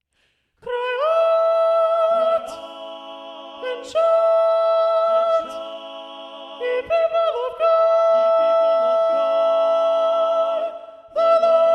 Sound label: soprano 2 predominant; knut nystedt